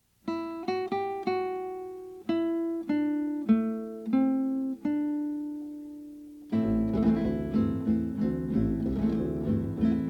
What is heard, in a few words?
Prelude guitar